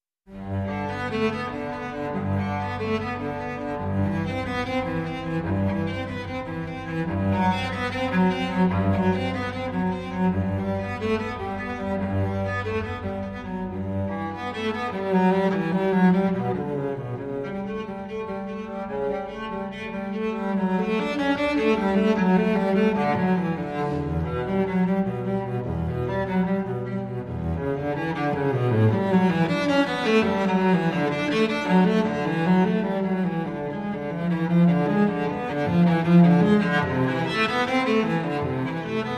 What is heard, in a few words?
Prelude from the Unaccompanied Cello Suite No. 1 in G Major, BWV 1007; J.S. Bach